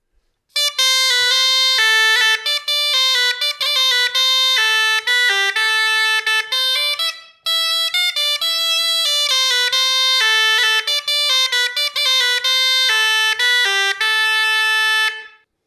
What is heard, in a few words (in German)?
Rauschpfeif